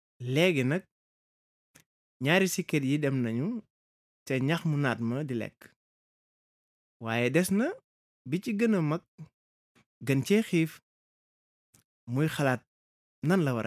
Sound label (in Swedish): bip